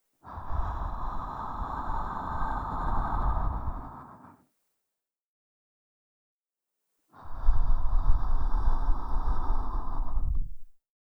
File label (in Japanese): 吐息